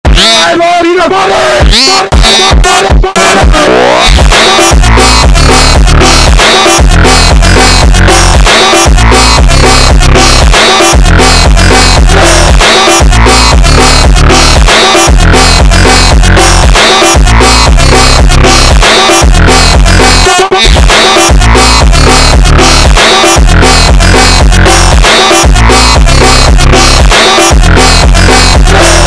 slowed

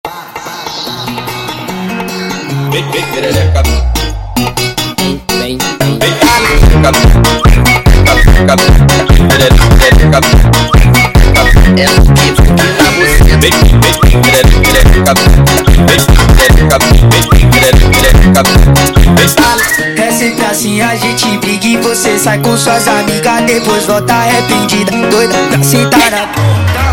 Whistle Funk Sped Up

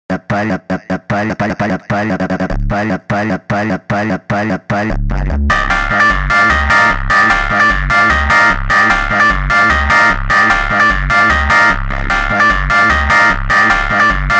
Montagem sped Up; فانک

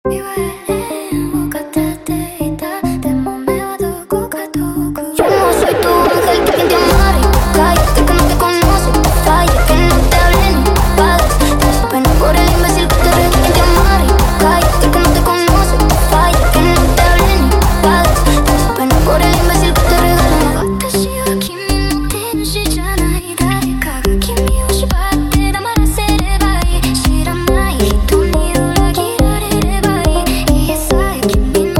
Jumpstyle sped Up

